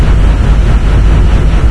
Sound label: large fan loop; Source Engine; Portal 2